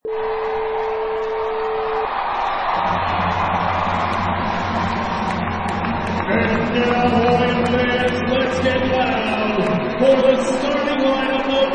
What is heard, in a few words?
Arena Crowd Yells